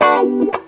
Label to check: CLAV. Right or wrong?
Right